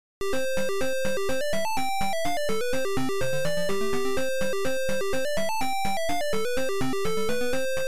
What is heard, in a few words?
Menu; Park Patrol; Firebird